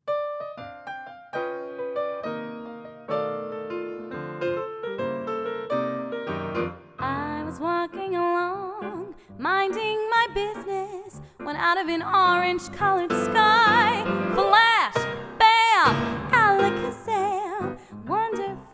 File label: piano